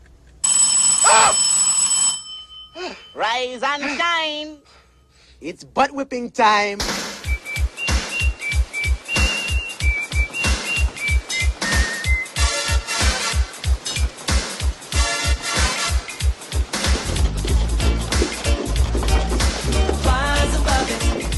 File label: alarm